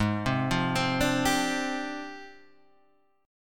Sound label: F Minor 6th Add 9th G sharp